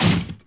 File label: wall